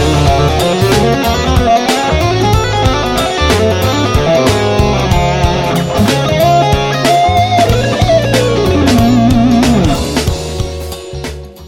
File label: guitar lick